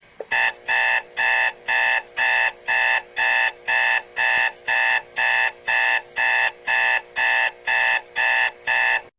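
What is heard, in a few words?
alarm clock; alarmclk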